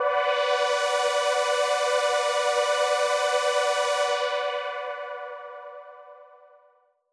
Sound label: Dramatic B4; KG MegaSaur